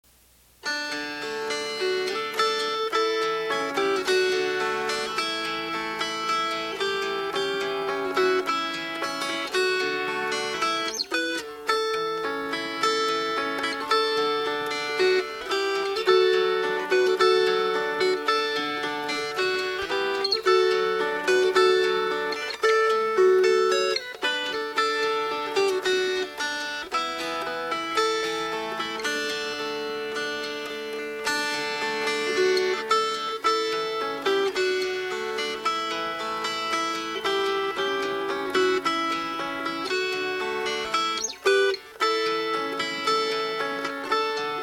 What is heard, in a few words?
Fingerpicked; My Faith Looks Up To Thee